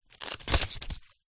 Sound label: household book very small page turn; zapsplat